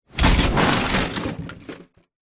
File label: impact crash truck large hard metal glass